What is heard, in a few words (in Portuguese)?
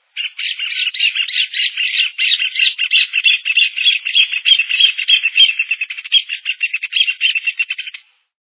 joao-de-barro